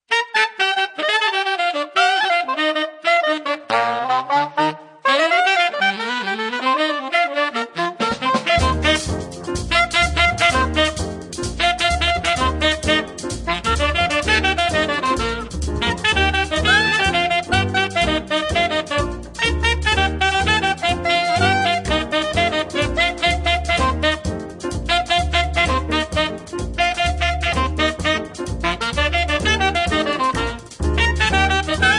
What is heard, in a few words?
Swingin The Samba